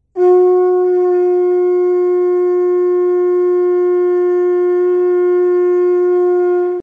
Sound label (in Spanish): La concha, garifunas